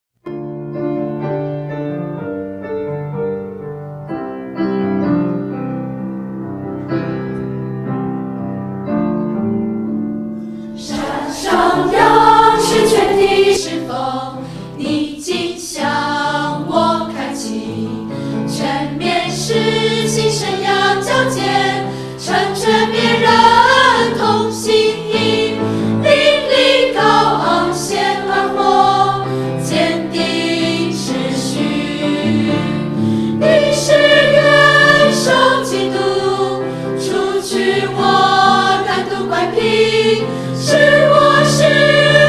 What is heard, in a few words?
Banner Song with piano